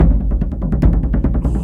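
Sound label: Music Shield; From Dust; ps3; xbox 360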